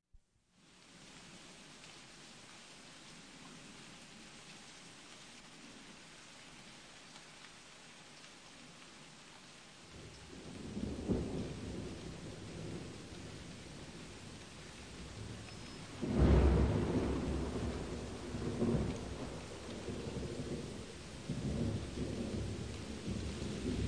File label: rain and thunder; Zoo and Nature